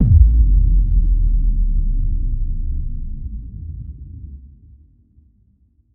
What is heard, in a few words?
Kick FX